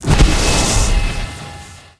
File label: spell iron burn; fighter